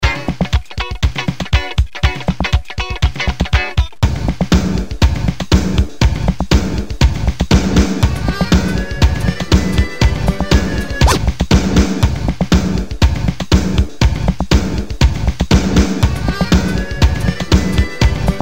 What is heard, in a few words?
techno intruders